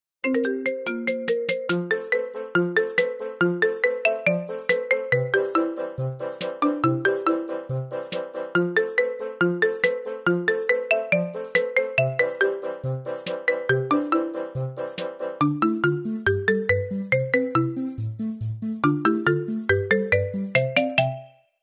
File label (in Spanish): Marimba, tono de llamada